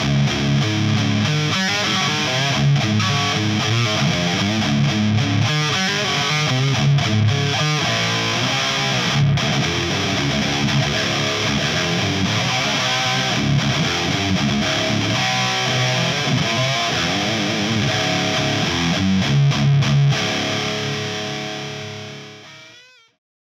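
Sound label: metal, guitar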